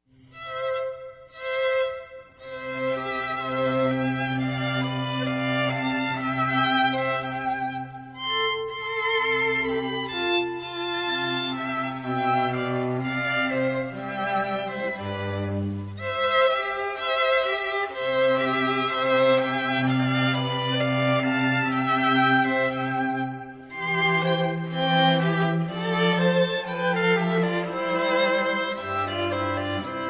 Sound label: String Trio; Eine Kleine Romanza